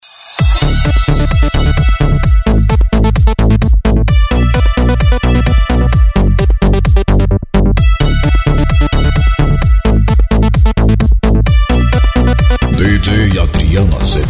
gospel dance

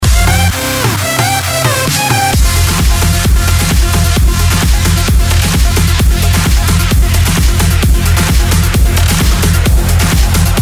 electro house

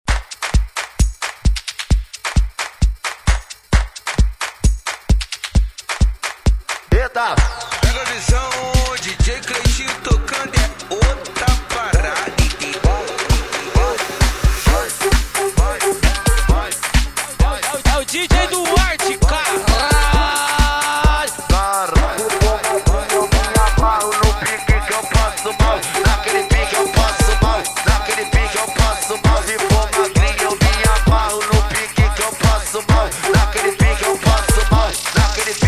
electro funk

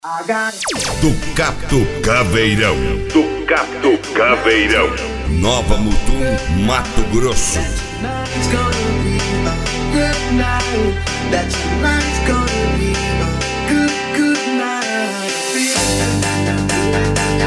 especial mega funk

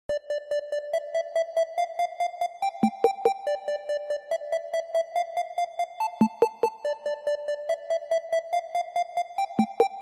bass

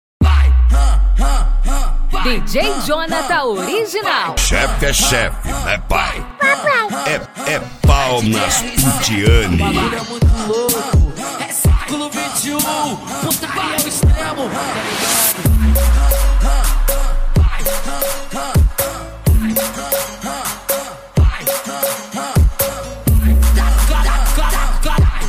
trap funk

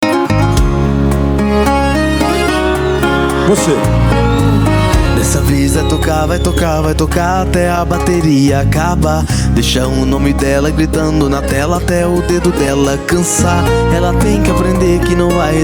sertanejo

